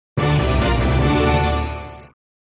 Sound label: Super Skill Shot & Big Reward; tales of the arabian nights williams pinball soundtrack